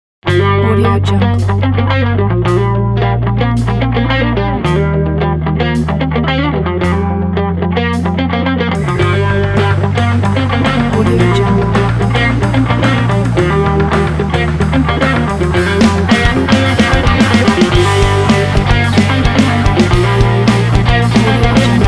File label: Inspiring Indie Rock